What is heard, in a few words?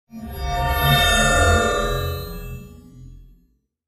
Glass Feedback Shimm